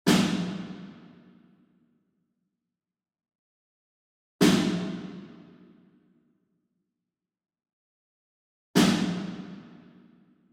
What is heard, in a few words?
SNARE Ludwig Black Beauty Hit Far; SINGLE; boom library; Cinematic Strikes Construction Kit